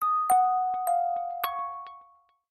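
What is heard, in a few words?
Samsung Galaxy S22 ringtone notification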